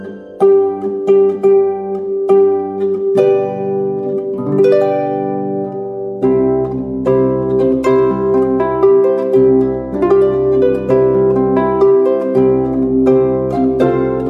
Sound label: Bridal Chorus